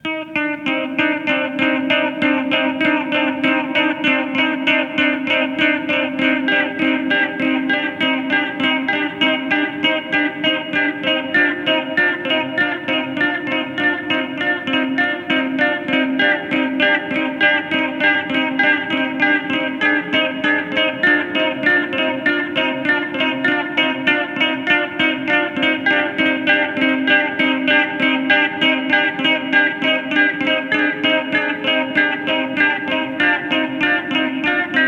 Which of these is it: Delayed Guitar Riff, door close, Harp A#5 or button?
Delayed Guitar Riff